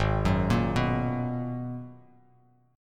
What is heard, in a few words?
Ab Minor 9th down Bass Standard; arpeggiated; JGuitar